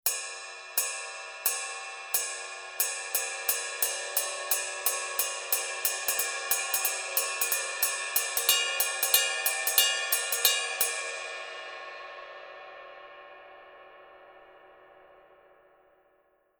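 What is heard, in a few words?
signature 20 duo ride inner pattern, cymbales; Forgées à partir d’un alliage secret de bronze; paiste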